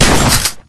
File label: mega destroyer; pixel gun 3d